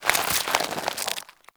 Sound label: Root Spike Impale End; Powers; Bromius